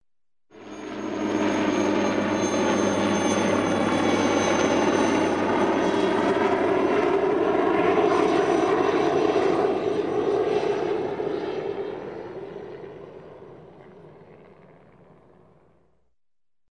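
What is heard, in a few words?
turboprop helicopter flies overhead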